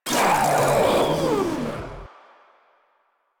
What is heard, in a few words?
Proto Infested Runner Death; Warframe